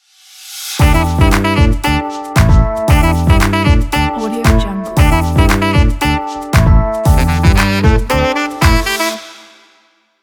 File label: trend funk hiphop sax logo